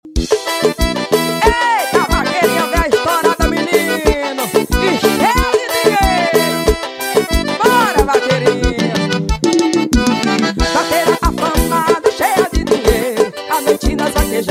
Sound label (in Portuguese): forró